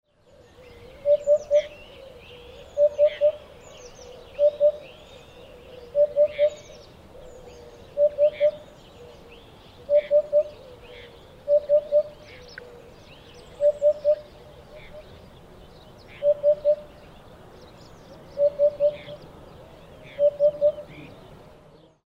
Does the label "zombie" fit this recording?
No